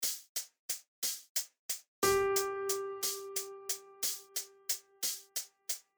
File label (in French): Ronde pointée Ternaire